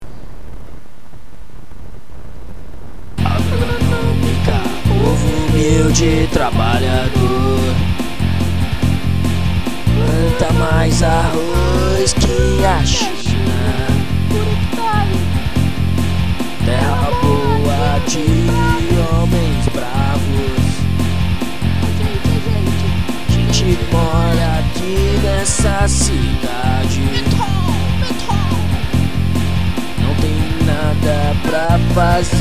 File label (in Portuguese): hino; hino da agronomica